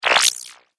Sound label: Alien Wet Element; Alien Sound Effects